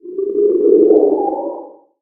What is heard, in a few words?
Menu Horror